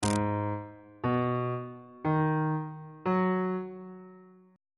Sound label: a flat minor 7th chord 1 is a flat minor 7th